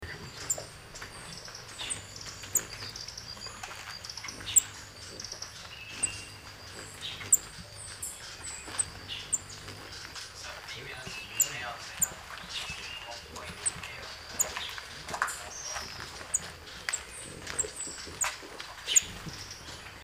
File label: Green thorntail